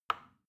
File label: plastic button tiny push soft